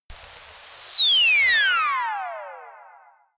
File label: ululation ufo